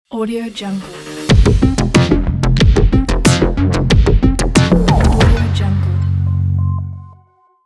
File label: epic industrial logo; alexandersizonenko